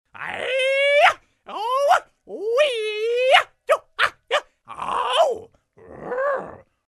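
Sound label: sounds of people fighting and fist punches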